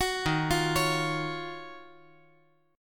Eb Minor 9th Eb; Ebm9; arpeggiated; JGuitar

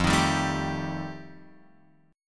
F 9th F Guitar Open F, chord; strummed; JGuitar

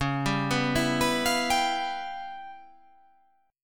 C sharp 7th Sharp 9th Flat 5th, chord

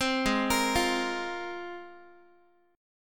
G sharp 6th Add 9th G sharp Guitar; G#6add9; arpeggiated; 15,16,13,15,x,x

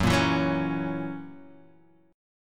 F sharp 7th, Guitar, Half Step Down

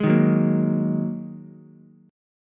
G sharp 7th Suspended 2nd G sharp, down, Ukulele; strummed; chord

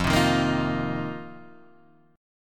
A sharp Suspended 2nd Suspended 4th

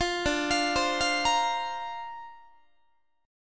D-Minor 7th Sharp 5th D Guitar, chord; Dm7#5; arpeggiated

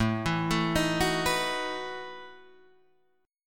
A 6th Suspended 2nd Sharp 11th, guitar; A6sus2#11 Chord {5 7 7 8 7 7} arpeggiated

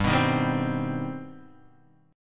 C Minor Major 7th, G, chord

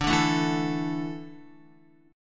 D Suspended 2nd D, down, Guitar; strummed

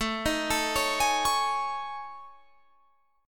A Major 7th Flat 5th, down Guitar Drop D

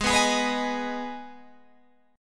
Ab Minor Ab down Guitar Open G

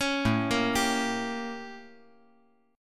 A 7th Flat 9th; 6,9,6,10

